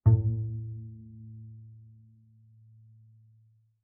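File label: Bass pizzicato fortissimo sul D A2, Double Bass; MIS Pitches - 2014; Strings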